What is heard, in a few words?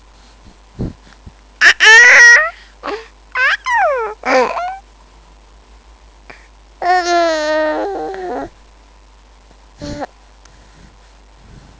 ahah; Je fais coucou